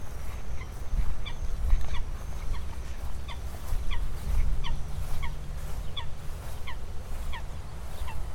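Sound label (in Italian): Folaga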